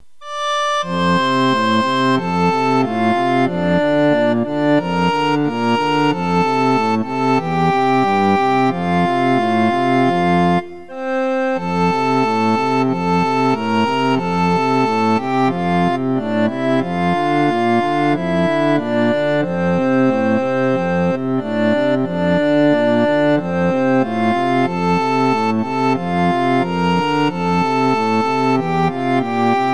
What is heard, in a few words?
organ